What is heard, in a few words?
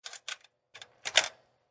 Slot Machine Insert Coin, Casino, gambling, Slot Machine. Insert coin into slot.; Slot Machines